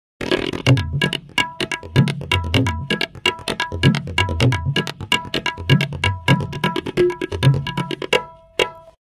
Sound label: tabla loop